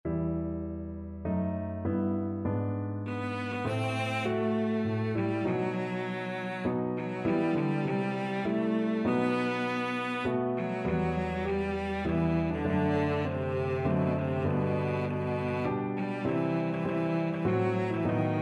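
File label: violoncello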